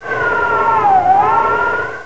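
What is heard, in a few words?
WIND TUNNEL; HIGHTECH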